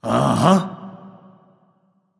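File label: nonverbal agree; smite; voicepack; ullr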